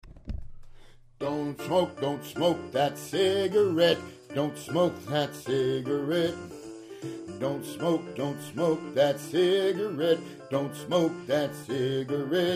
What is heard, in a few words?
vocals